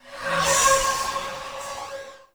Generic Foley; Warframe; Choir

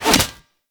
Fishing Spear Line Retract Catch; Warframe; Eidolon Fishing Spears

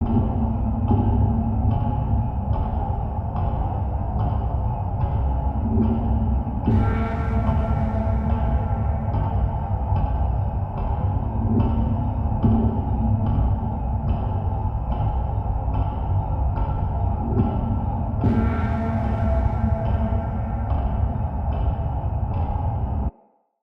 Prisoner Loop Ambient Machines; New Player Login Sequence

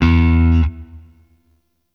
Shawzin Oct Two E; Warframe; Sentient Shawzin

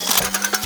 Heavy Grenade Launcher Reload; Warframe; Archwing

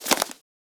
Foley Idle Ear Flap; Enemies; Warframe; Catbrow

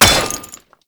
Royal Guard Staff Stomp; Warframe; Grineer; Foley

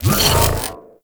Leap; Warframe; Venus; Venus Terra Shockwave Moa; Abilities

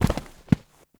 Foam Block Impact; Warframe; Ambience; NineteenNinetyNine

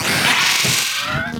Amalgam Moa Vocal Death

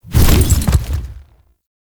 Horse Jump Barrel Roll; Vehicles; Foley Actions; Warframe; Duviri

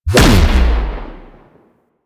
Blastor Pistol Explosion; Warframe; Weapons; Corpus

